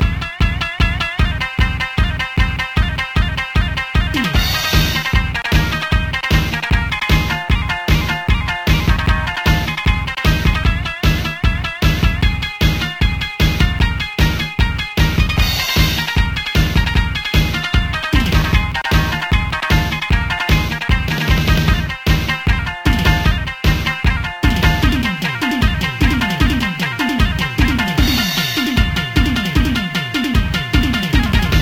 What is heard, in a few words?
shamisen solo; Ninja Warriors; PCE